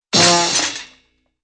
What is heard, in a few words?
honk and cymbal crash